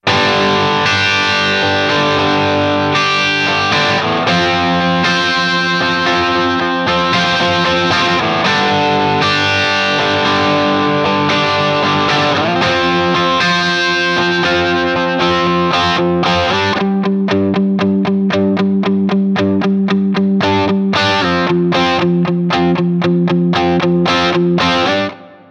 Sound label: Guitar Rhythm Dry